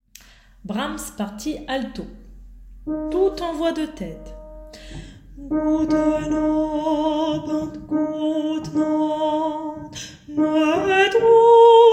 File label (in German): Wiegenlied partie alto